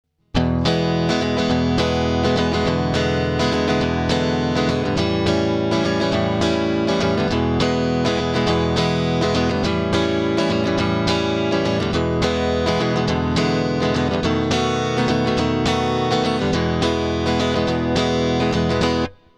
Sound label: blues prog